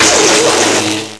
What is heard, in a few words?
zap; hl1mp; XDM